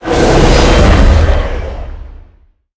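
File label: boss show death, monster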